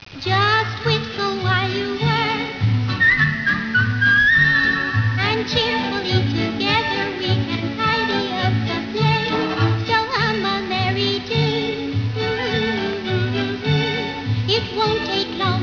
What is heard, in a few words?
whistle